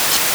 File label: pond